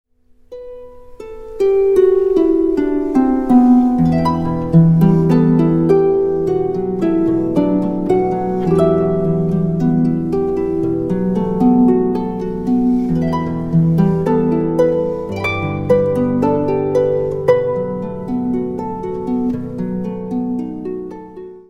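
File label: Sing Hallelujah, harp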